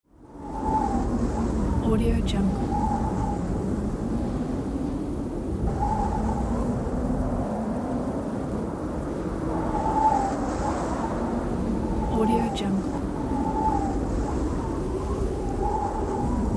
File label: wind